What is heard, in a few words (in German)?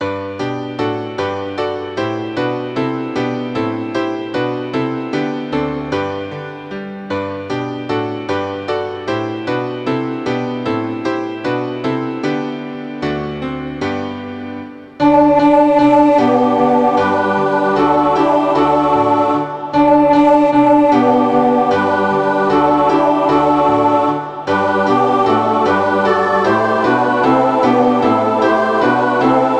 kein schoener land ssaa klavierpartitur